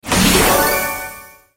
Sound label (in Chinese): PK胜利